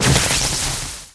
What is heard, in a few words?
Electric